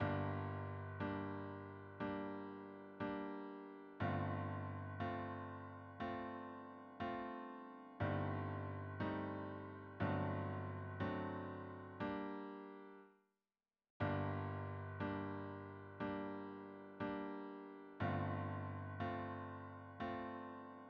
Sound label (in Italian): piano